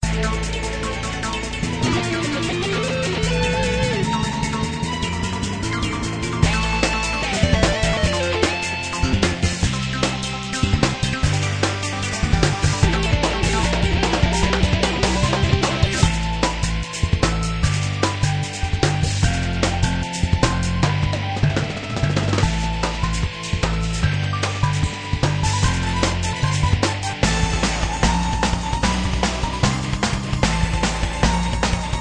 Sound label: battle music; the saga of conquest; android